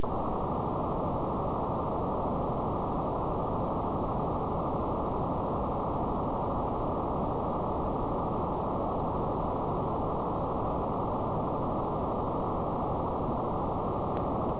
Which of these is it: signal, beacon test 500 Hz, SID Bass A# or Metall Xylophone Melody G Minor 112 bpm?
signal